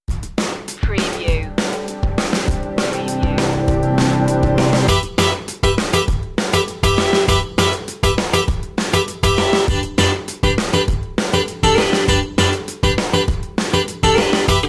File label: no main guitar